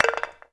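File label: Skull Stone Hit; moul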